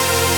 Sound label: Synth Cuts; Vengeance Samples; VES1 Instruments